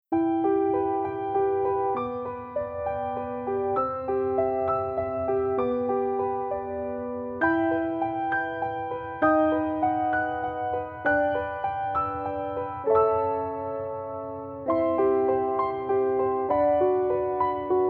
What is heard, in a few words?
music saved me melody